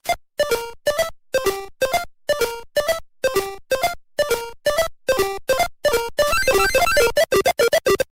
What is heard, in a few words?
Platform Timer